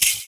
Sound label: FOOT PERC; Univers Sons - Raricussions